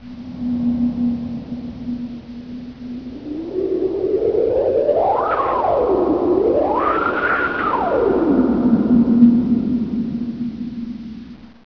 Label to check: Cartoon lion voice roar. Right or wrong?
Wrong